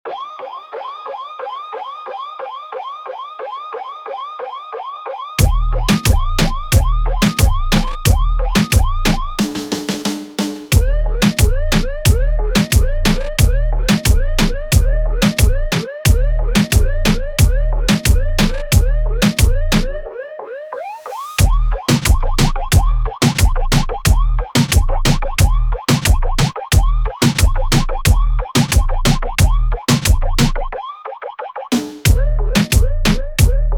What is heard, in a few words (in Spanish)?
reggaeton